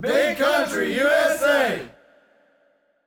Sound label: Shout